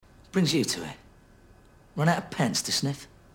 Sniff; Snatch

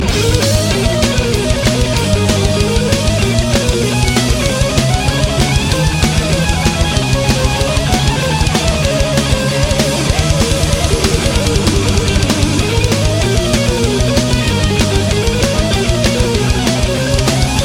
Baroque And Roll

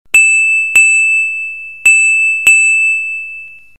Sms Tone

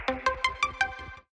notification

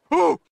"OOOH"

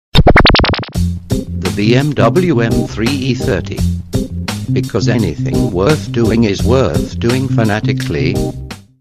Bmw

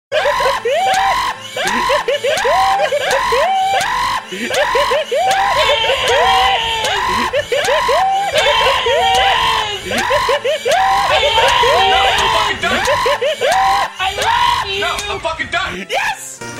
Alarm Clock

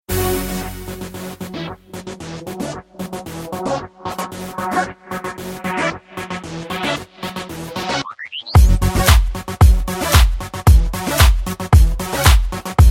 Cool Club Beats

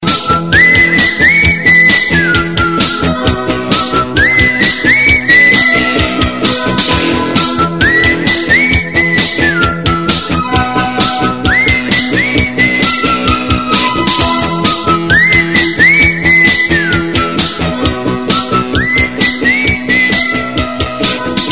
Bandmix

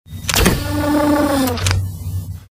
Futuristic Door

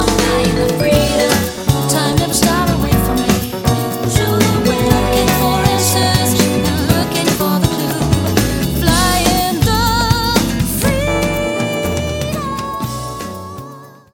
Chorus; Rouge; meloboom

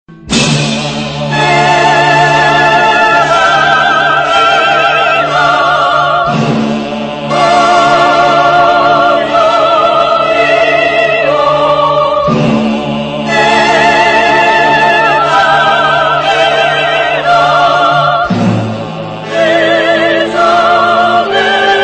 Game Over

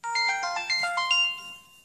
Sms

